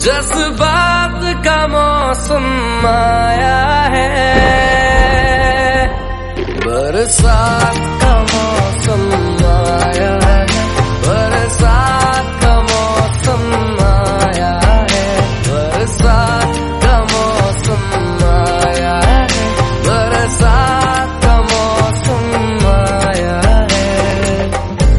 hindi song

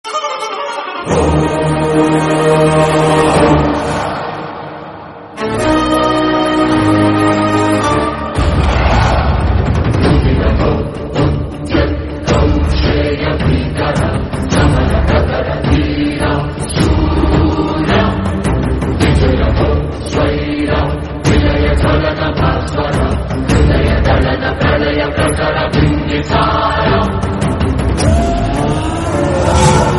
telugu bgm; bimbisara; nandamuri kalyan ram

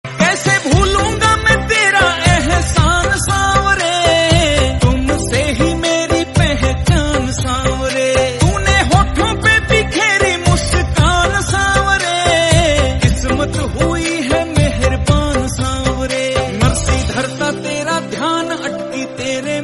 khatu shyam bhajan

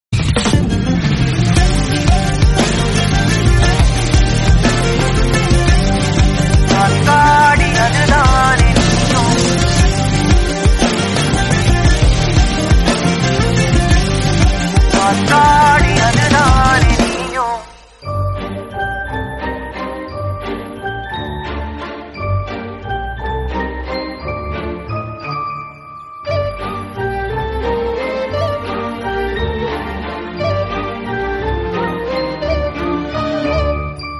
instrumental bgm

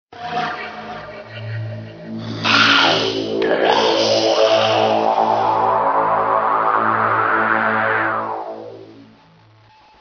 scary tone

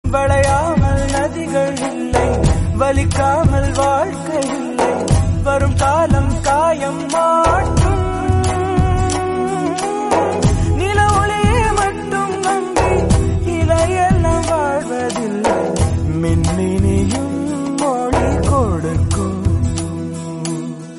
tamil song; kaadhal

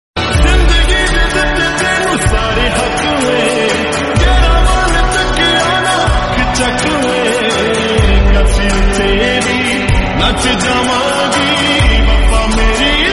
lofi remix

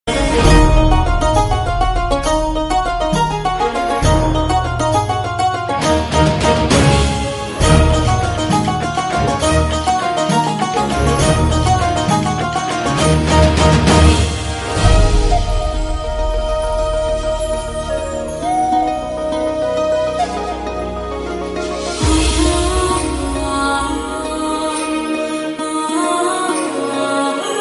title song bgm; aladdin

